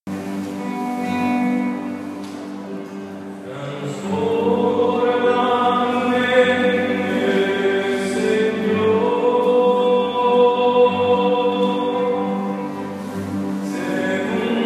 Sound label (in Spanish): Canto entrada